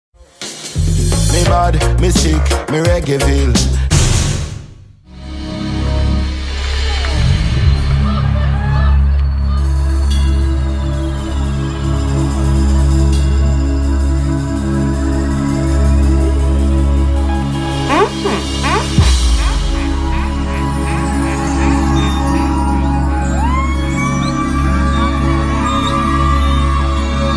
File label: reggae on the live stage in concert; reggae artist; caribbeanradioshow